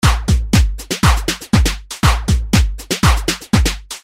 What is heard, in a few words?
rhythm loop Elastic flanger; Sound Graph